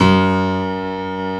BECHSTEIN D#2; East West - Global Sounds

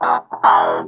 WOW, Funk Guitar; 90 s Sample CDs; Zero G

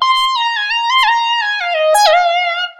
lead (funky riff); Sonic Foundry (Sony Creative Software); Ugly Remnants VOL-2; Leads

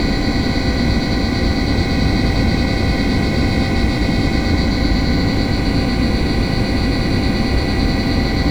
BOILER, AMB; ENVIRN-AMB

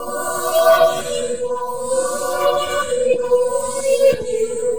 Choir, Vocal; Crimini

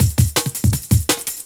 Yes Yes 165, Drum&Bass Breaks; EZ Rollers

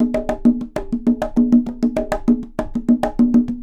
Percussion, 132; Sampleheads; NYC Percussionworks; 90 s Sample CDs

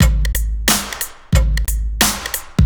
90 DRUMS

